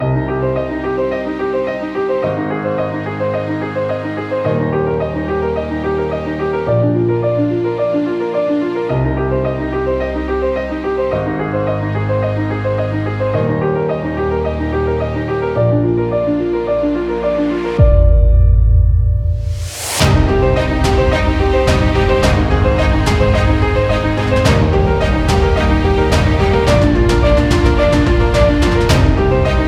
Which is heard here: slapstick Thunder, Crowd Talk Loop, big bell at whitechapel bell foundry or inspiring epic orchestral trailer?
inspiring epic orchestral trailer